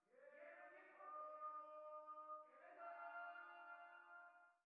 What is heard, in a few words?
amb People; netent